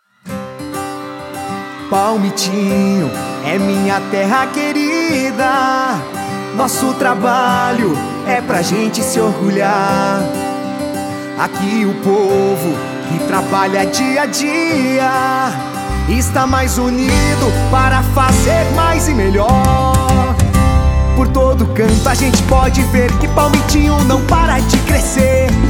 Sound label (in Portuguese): Jingle PREFEITO; Caetano; Palmitinho